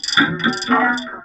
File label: VOX VOX, Electro Vox